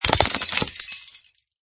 Bullet Machine Gun Impact Glass, Bullet hit: Machine gun projectiles impact with glass.; Impact Sound Effects